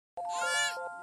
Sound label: Sloth squeak